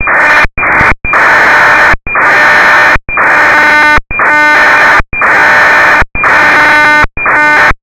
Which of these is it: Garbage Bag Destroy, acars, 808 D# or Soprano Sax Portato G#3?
acars